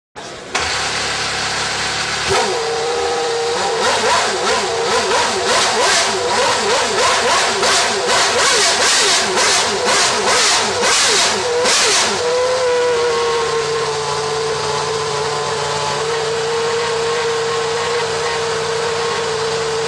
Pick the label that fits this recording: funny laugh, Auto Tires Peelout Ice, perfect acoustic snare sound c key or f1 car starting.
f1 car starting